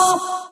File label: Sounds F#; Vengeance Samples